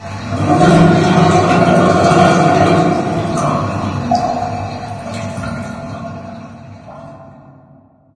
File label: ambient water drain; video games; nature; source engine; portal2